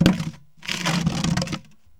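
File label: BOX RATTLE, Box Noises; E-MU Producer Series Vol. 3; Hollywood Sound Effects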